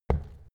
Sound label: step wood indoors